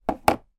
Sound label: plastic container case small place down on table